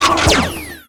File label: WAR ATTACK